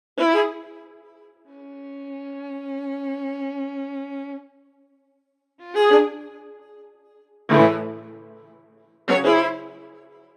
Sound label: Violin String